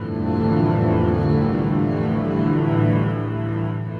Acoustic Drone, Short Loops; Sonic Foundry (Sony Creative Software) - Friendly Fire; DroneLoop

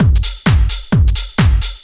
straight, Techno Trance Essentials drum loops

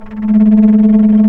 FLT, Bass Flute, Bs Flutter

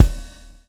swing

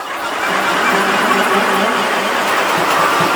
SFX, 140 BPM, Drum & Bass; Ueberschall; Drum & Bass Flash Trackz

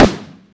processed snare, Deep House

